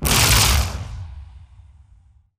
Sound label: bullet energy titan arc; Titanfall 2